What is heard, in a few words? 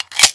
m1911 reload clip in; svencoop; ww2projekt